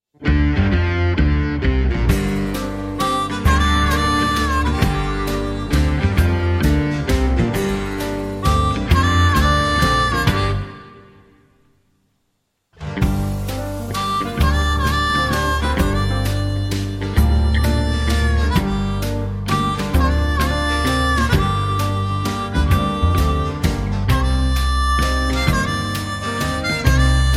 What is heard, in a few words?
(Gm) No Backing Vocals